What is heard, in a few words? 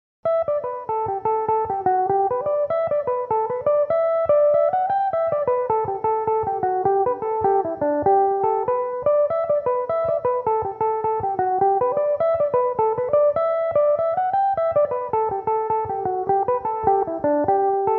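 G major, mandolin; Belmuse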